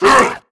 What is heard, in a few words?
attack pre, zombie vox; creatures